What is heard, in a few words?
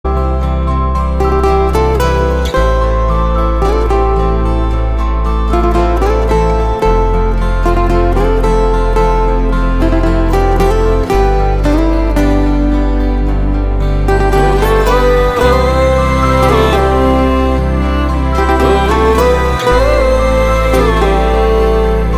Instrumental Ringtone; Bollywood